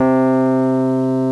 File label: piano key low